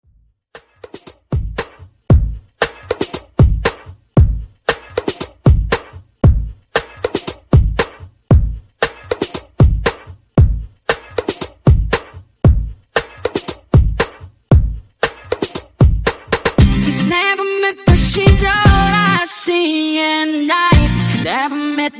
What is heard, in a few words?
Top40 Mixshow Intro 116 Bpm